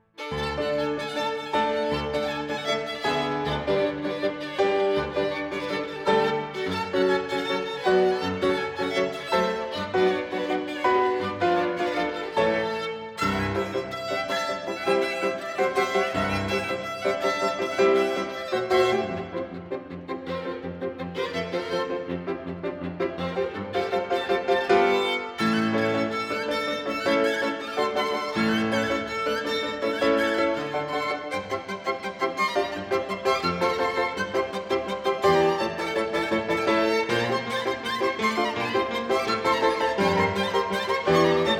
Romanian Folk Dances for violin & piano, Quick Dance; Maruntel